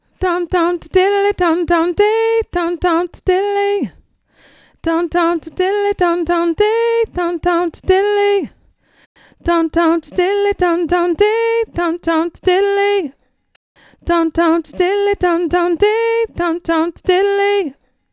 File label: epic diva